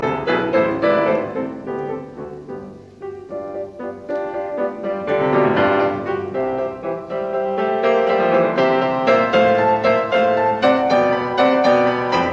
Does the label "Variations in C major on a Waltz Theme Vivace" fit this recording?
Yes